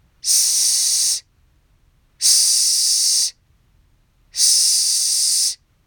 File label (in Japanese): 子音S同じ長さで吐く